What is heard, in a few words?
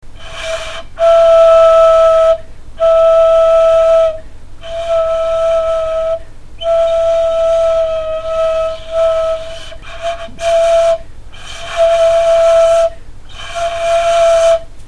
flute; adult violin